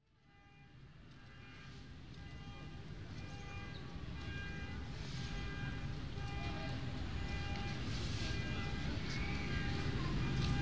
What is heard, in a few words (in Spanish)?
Paisaje sonoro recorrido Plaza de Florida (Binaural); ascenso a Seckbuy montaña sagrada